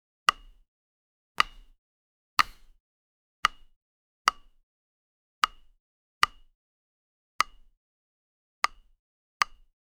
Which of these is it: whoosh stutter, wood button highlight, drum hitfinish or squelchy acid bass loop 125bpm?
wood button highlight